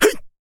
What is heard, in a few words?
attack